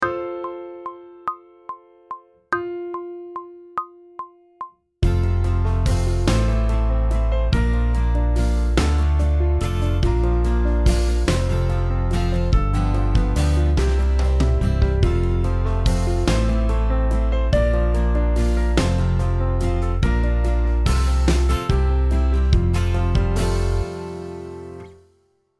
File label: Sight Singing backing track, pop vocals; Grade 4